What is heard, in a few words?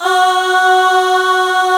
POP AHHS